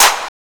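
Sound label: CLAP; E-Lab; X Static Goldmine 1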